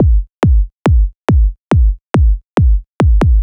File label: Kick Loop, Drums, Dance Beats